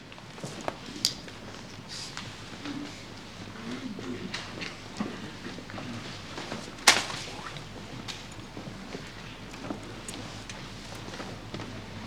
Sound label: school examination, crowds interior; best service Blue Box